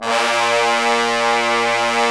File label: BRS TBNS, Bone Sec ff; Roland L-CD702; Bone Sec.FX